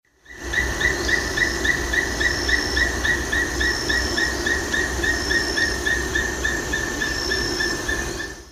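kalophrynus pleurostigma, Black-spotted Sticky Frog call; Johor, Peninsular Malaysia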